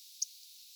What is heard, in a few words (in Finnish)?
tietaakseni keltasirkkulintu